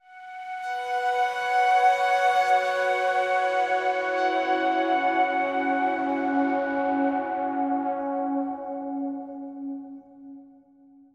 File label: Synth B Long